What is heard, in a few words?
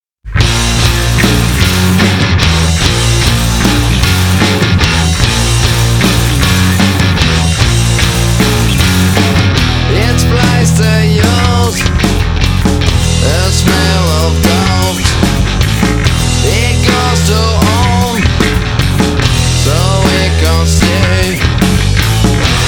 high tree